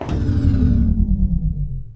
falling warning pitch down

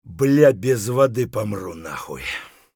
dehydrated; Escape from Tarkov

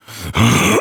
vce effort large

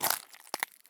walk glass; Escape from Tarkov

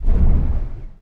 hacking interface move up

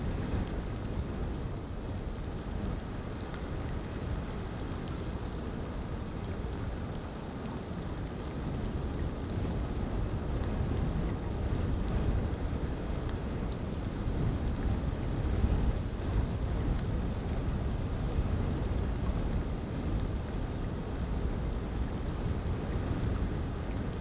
ambience field night windy drizzle; Rust

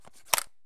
mp7 stock off; Escape from Tarkov

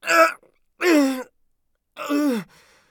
scav death, voice; Escape from Tarkov; scav3

